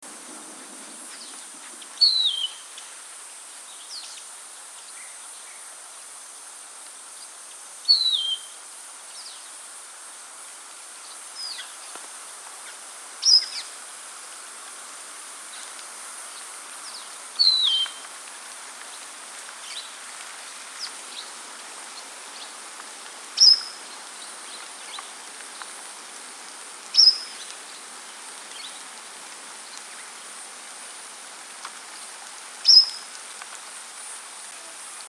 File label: Cape wagtail